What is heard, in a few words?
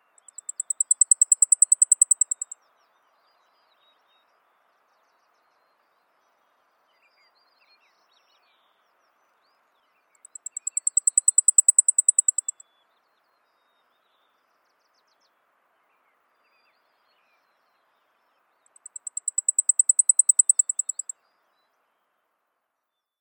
Blackpoll Warbler